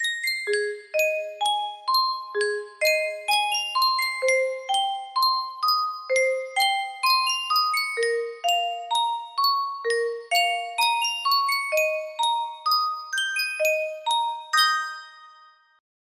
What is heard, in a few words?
yunsheng music box, melody